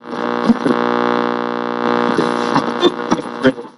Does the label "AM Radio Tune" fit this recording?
Yes